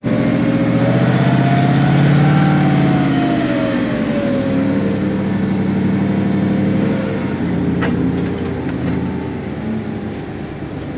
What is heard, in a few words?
industrial logging loading logs on truck with hydraulic lift; sound effects one